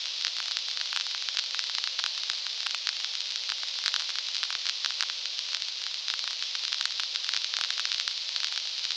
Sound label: vinyl; Masterbits; Vinyl Attack